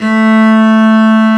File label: CELLO A3; AKAI S-Series CD-ROM Sound Library VOL-2